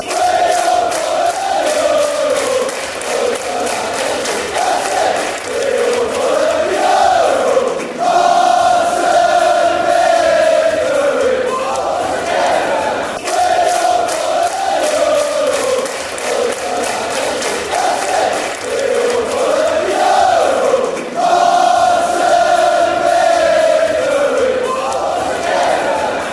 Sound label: altogether now, fanchants